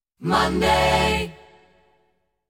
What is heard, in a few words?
Monday, jingles